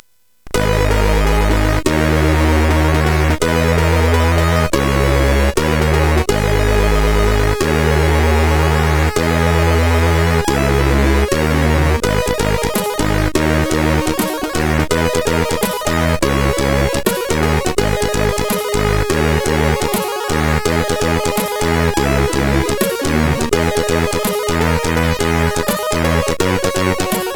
Disco, sid CSG8580R5

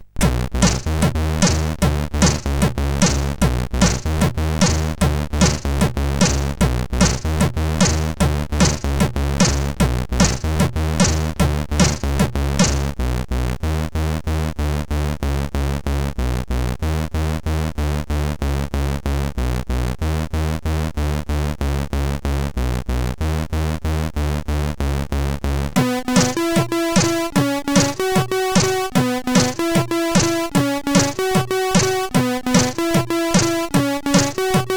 CSG8580; Square Scape 2

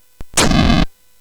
Shuffle, CSG8580R5

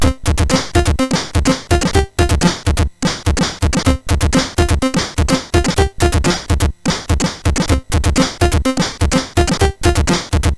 Nitrogenium, MOS6581R3

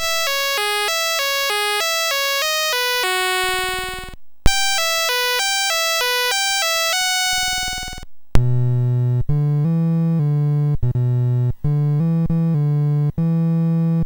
Music BASIC sid MOS6581R4

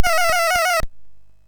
Dragons Den, MOS6581R3

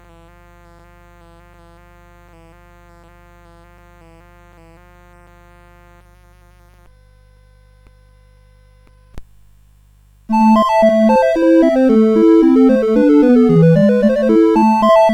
Badinerie, CSG8580R5

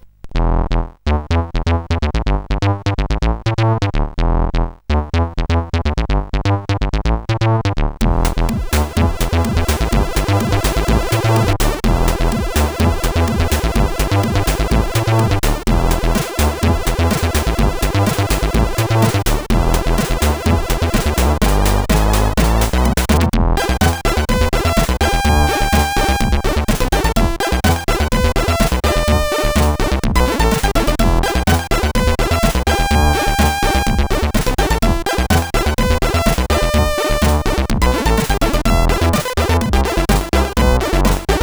Jazzo, sid CSG8580R5

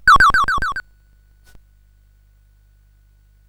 Shuffle, CSG8580R5

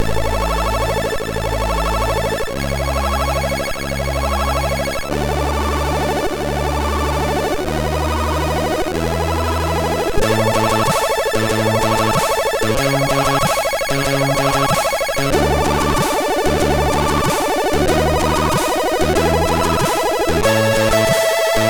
Hi-Score sid MOS6581R2; Onslaught

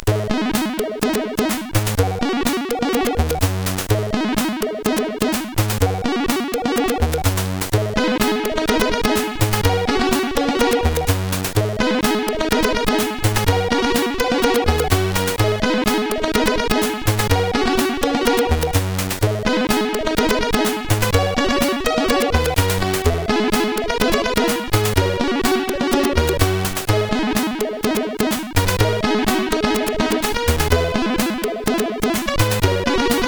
Cracktro, sid, CSG8580R5

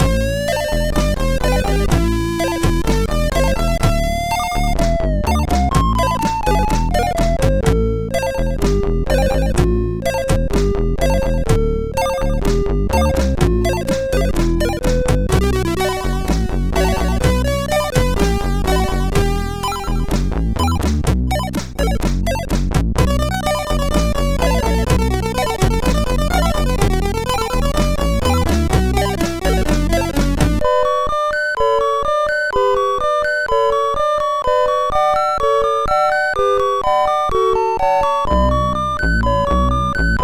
Sleep, MOS6581

